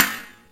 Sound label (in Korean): geumsog cineun sori